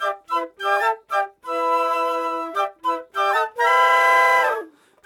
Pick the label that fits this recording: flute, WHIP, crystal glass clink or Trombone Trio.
flute